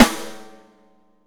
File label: bulguing, snares real